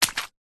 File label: foley footstep single hard soggy wet grass mud